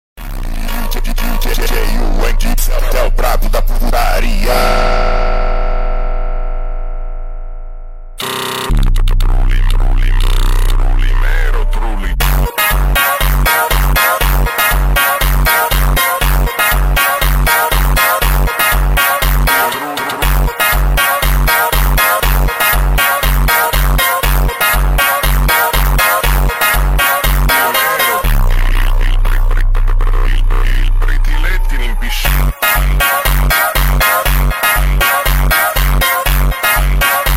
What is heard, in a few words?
funk slowed reverb